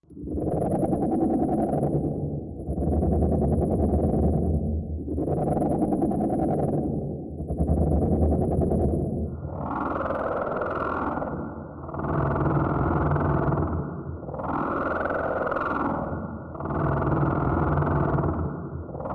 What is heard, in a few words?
Machine, Pulsing, Alien Breath, Reverb; Beast Extraction